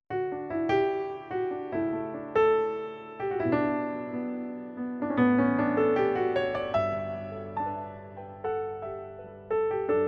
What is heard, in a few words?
french